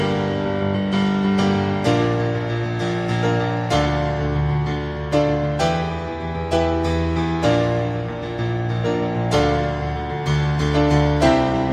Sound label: Theme Piano